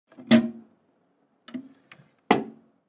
HOUSEHOLD TOILET: INT: Seat down & up, squeaky seat with toilet resonance.